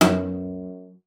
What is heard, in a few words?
CELLO FINGER F#2; Xsample VOL-5